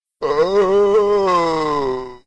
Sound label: zombie Hoouw